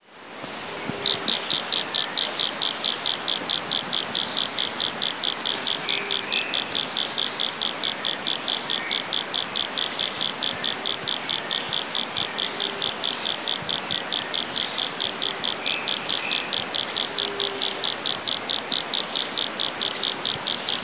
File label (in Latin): Brachycephalus ephippium; Serra Japi; Jundiai; SP; Silveira